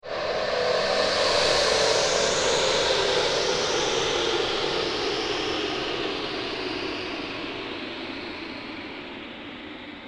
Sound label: Graveyard Wind; Scary Soundscape Sound Effects